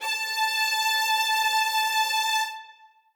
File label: string